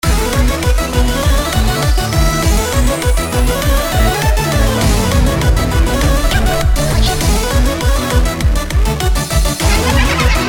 Lab Higher BGM Fast; Gremori; closers

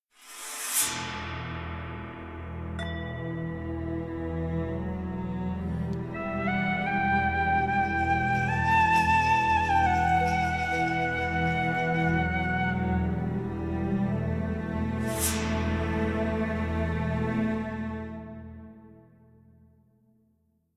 Music Stem

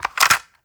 Cassette Rattle

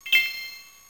Credit SFX

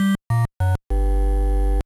Jingle, gba; backyard baseball 2002

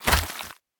Enemy Boss Hurt; Smilinguido

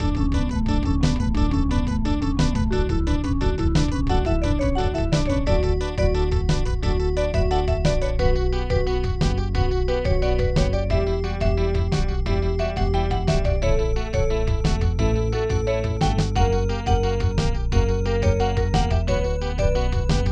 Bonus Level; magicboy; snes

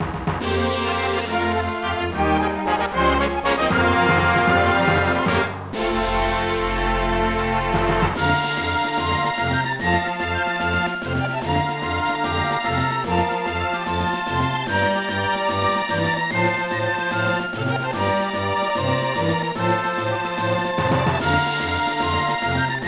Victory!; Monster Summoner; GBA

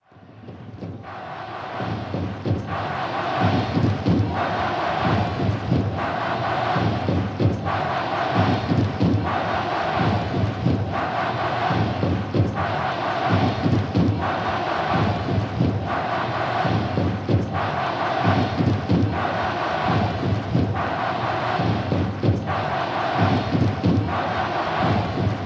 Chants; Takahara Chant

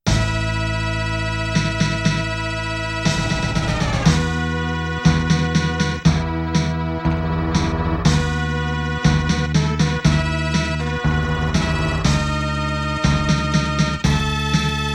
Vicious wind; Estpolis Denki 2; Lufia 2 Rise of the Sinistrals

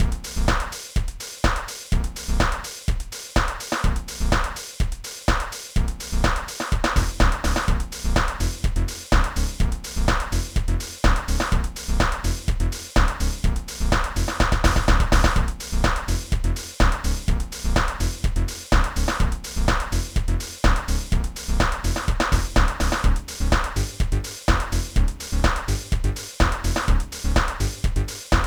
Loading Screen; Project X; Amiga

